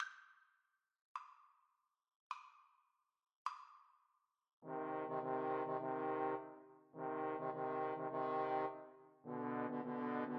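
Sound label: summer 2nd movement four seasons, Trombone Trio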